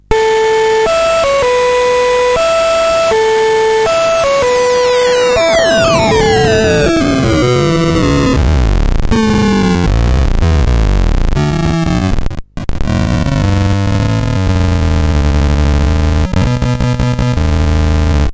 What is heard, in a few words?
bitcrusher triangle